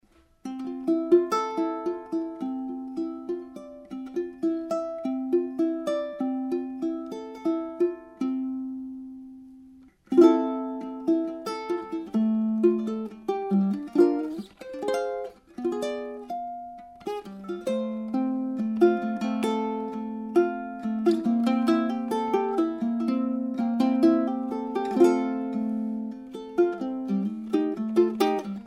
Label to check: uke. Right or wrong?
Right